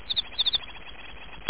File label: mushi; Internet 1996 World Exposition; Japan; NTT; winter